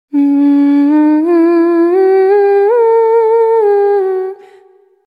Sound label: dialog humming; odette